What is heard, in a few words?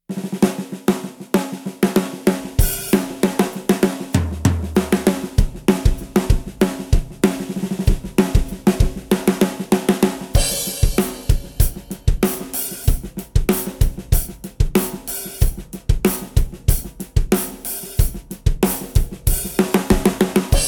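Pearl Export Snares On, drums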